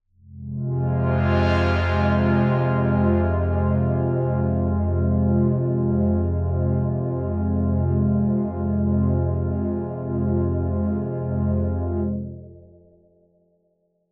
One Shot Drone F, dystopian drone; One-Shot Drones

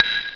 bottle; ST-XX Amiga Soundtracker Sample Packs

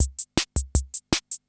CR78

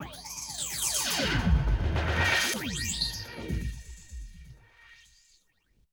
SWEEP LP; AMG; Global Trance Mission VOL-1

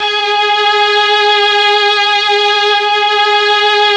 Melstgs3, MelloStrings; Oldies

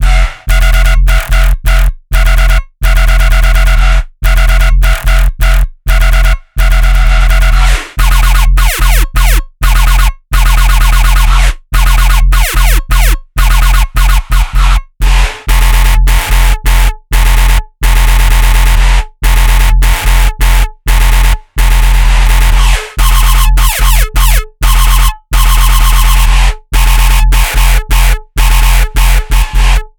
140 BPM F bass house

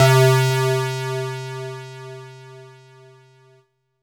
Synths Note C

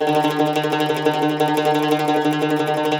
CUMBUS Tremolo

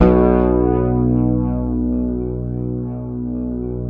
Fingered bass B1 L; Sampleheads; Will Lee Bass Library

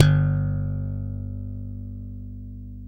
BS, Funk Bass

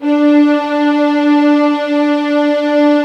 D4, soft violins; Q Up Arts; Denny Jaeger Master Violin Library

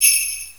jinglebell; jazz jackrabbit 2

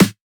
Snare, Dance Drum Kit; Drum Oneshots; Twilight; Monosounds

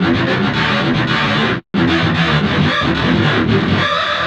GTR, Chemical Beats

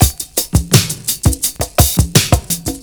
PERCS, 70 s Breakbeats

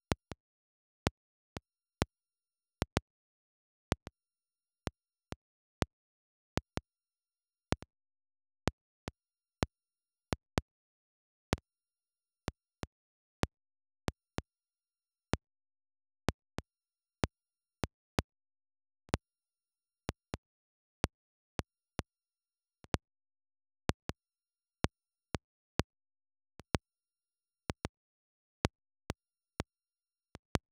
Dust Noise, Phonograph; Roland BK-5

